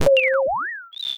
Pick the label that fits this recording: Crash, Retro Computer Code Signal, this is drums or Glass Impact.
Retro Computer Code Signal